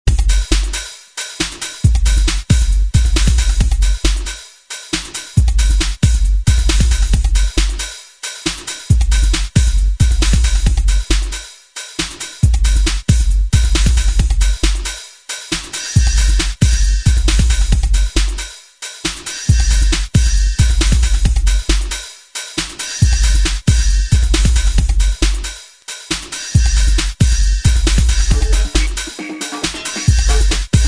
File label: PUSHING PERCUSSION